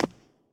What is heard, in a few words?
concrete land; Golden Crayon Sounds